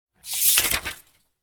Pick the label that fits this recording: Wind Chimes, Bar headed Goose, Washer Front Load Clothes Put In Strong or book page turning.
book page turning